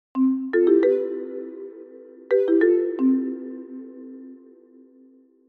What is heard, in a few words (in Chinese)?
功能反馈音